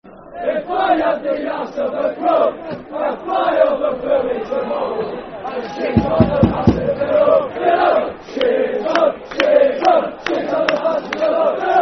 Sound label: If I Had The Wings Of A Sparrow, Football Chant (Soccer Songs); Darlington Dfc